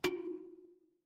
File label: cartoon bonk